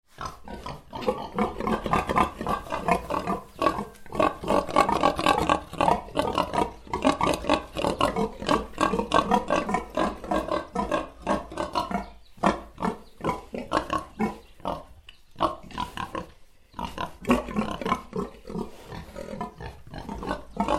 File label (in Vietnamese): tieng con lon keu heo keu